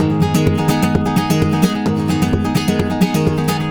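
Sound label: 130 G# rumba minor, Spanish And Gypsy Traditions; Soundscan vol.59; AKAI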